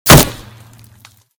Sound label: wood, bullet collide; Anomaly Bullet Cracks and Impacts Redone